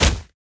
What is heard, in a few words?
Racket Snowball Hit